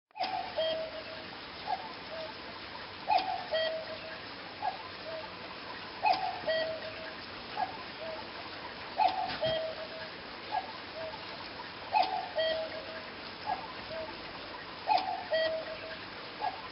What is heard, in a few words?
Quartz cuckoo clock sound